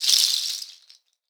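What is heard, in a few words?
shaker, Synclavier Percussion, World & Orchestral; Synclavier Percussion 2; ILIO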